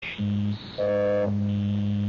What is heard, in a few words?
She Saw Me No Expression, voder